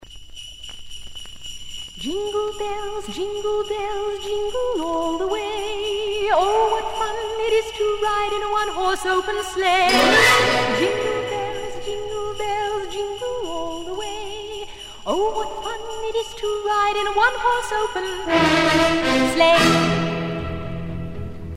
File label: jingle bells